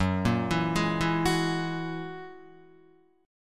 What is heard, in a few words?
Gb 9th Gb down Guitar 4E,4A,5D,5G,5C,6F; arpeggiated; JGuitar